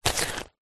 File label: purse pouch leather containing coins and notes grab pick up; zapsplat; foley